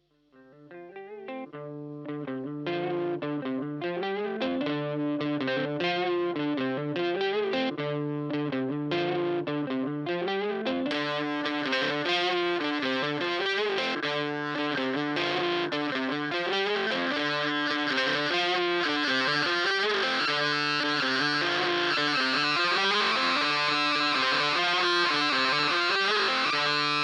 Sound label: Overdrive; death by audio total sonic annihilation